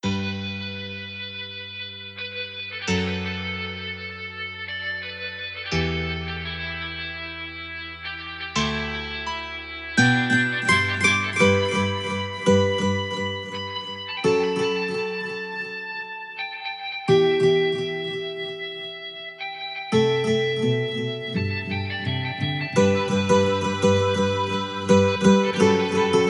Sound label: Instrumental